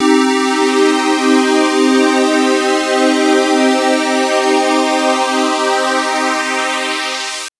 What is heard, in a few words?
Synth Pulse Sweep Pad min c3